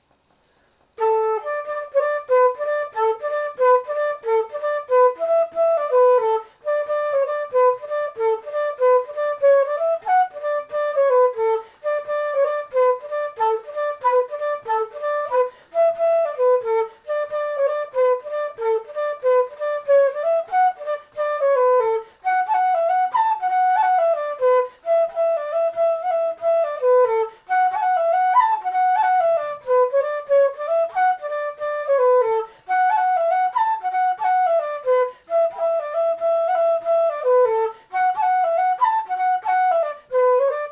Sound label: Jig of Slurs